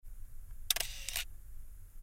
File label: leica shutter